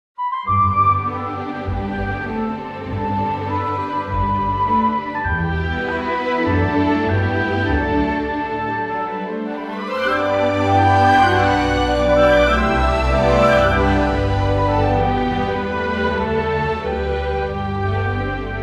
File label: 3 layer strings ww s horns